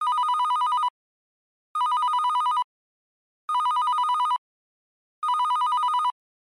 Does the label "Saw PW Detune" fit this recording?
No